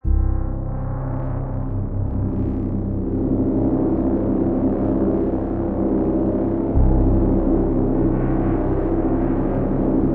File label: 72bpm